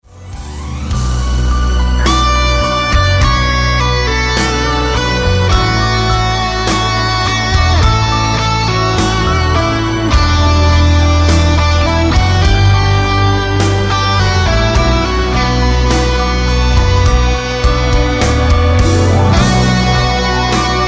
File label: Instrumental